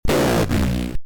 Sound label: alien first words plus daddy pitch